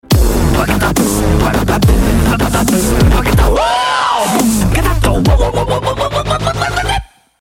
dubstep

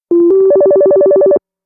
Nice Ringtone Fast

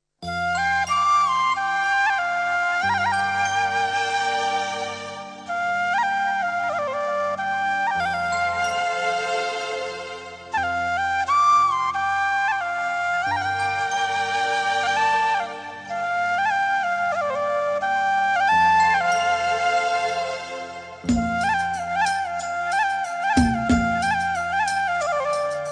Cute Flute

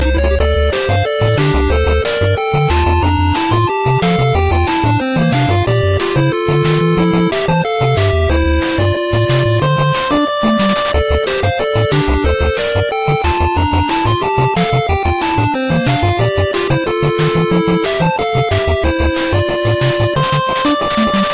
Danger 8bit

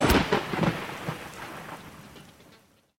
Thunder

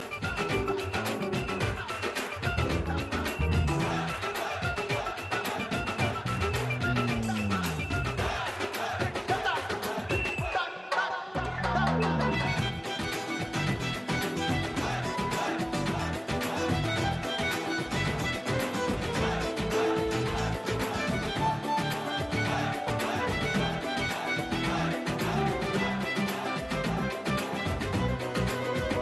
Jazz Music; In Punjab

